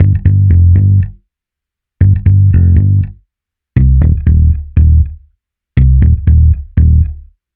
Bass Guitar 120BPM Gm; 99Sounds x Monosounds; Instrument Loops

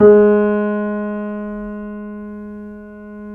KEY, YC7 Piano pp

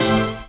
CHORDS; EastWest; Dance Mega Hip Hop Electro

